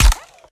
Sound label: Gun Auto Fire, Sci-Fi Weapons Cyberpunk Arsenal; Shapeforms Audio